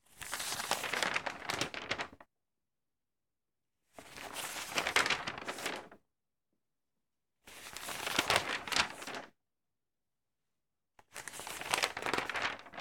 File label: Reading Newspaper